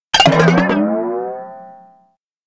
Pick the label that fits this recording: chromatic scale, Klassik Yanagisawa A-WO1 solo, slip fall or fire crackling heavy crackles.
slip fall